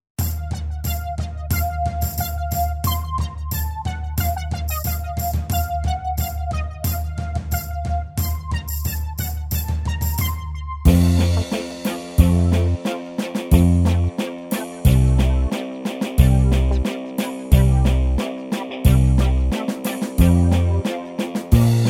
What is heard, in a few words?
Karaoke